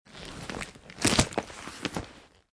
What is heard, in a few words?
case luggage